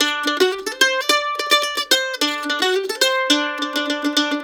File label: LICK, Blues Guitar